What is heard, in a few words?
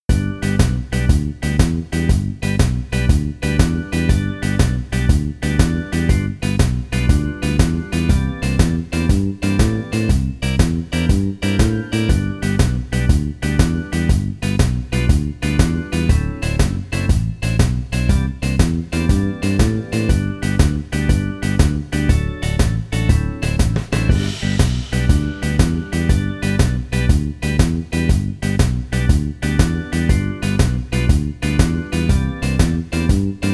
Blues in G